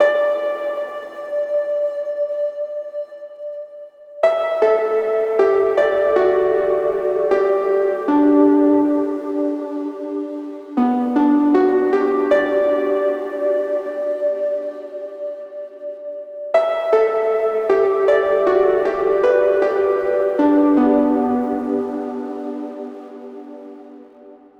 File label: Synth Em 78, Lo-Fi; HipHop; Melody Kits; Loops; Ghosthack; AC2024 - Day 5; Kit Memories